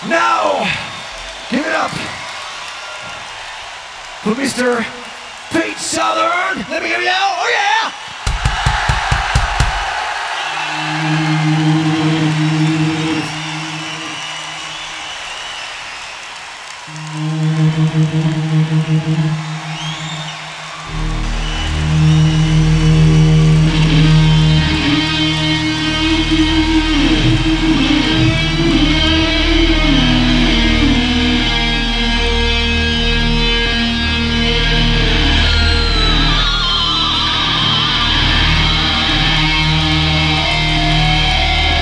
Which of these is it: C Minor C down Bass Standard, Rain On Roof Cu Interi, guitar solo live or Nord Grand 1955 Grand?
guitar solo live